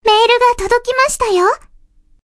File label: menu freeplay click; New Zealand